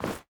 Step Walk Snow; SandBox Musics

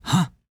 Male Roll; SandBox Musics

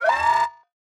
Generic Siren; SandBox Musics

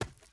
Sprint; SandBox Musics

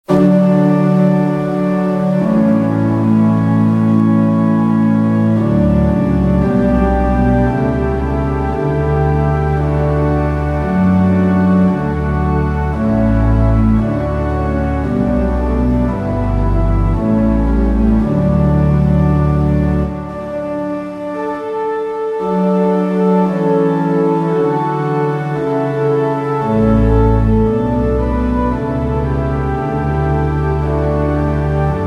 Church; UWO BGM

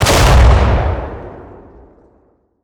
Explosion; SandBox Musics

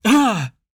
Male Hurt; SandBox Musics

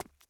Run; SandBox Musics

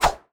Sword Swish; SandBox Musics

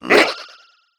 Alert; SandBox Musics

